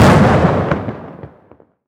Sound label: EXPLOSION Distorted Long stereo